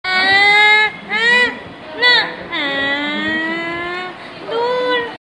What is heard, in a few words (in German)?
Baby Ton